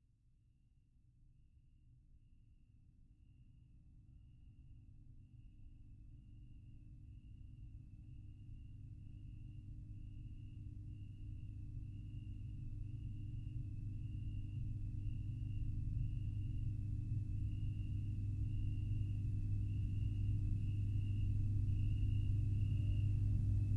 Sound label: soundscapes